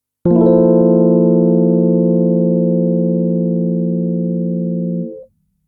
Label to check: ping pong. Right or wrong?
Wrong